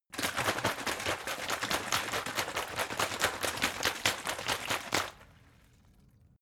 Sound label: bottle water big shake; gastronomie; berufe